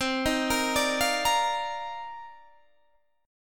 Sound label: C Minor 11th C